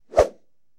Arm Whoosh, Hand To Hand Combat; Sony Pictures Sound Effects Series

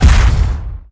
fast hit; RYL 2

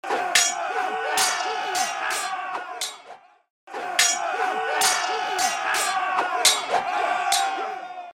Battle Cries; Age of Empires III; cinematics

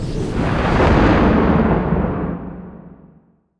hammer; RYL

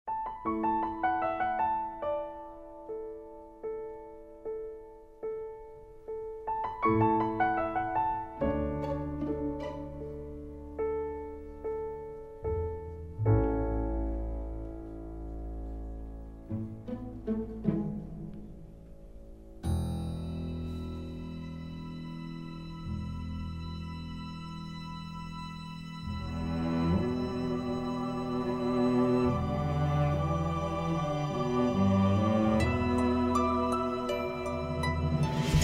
Music

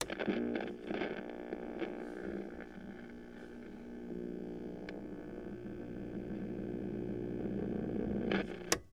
Old Radio On Hum Off; Sony Pictures Sound Effects Series; Period Devices

